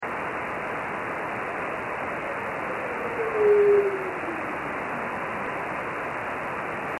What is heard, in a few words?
Iridium33 Echoes